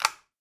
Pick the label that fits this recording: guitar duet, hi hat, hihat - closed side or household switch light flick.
household switch light flick